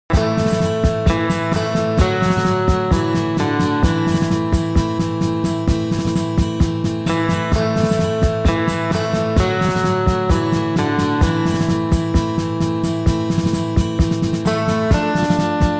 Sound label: no Bass